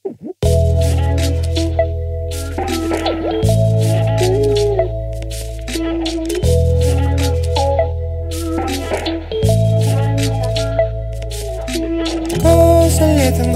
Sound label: guitar